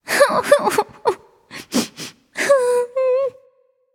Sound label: nv boohoo; charybdis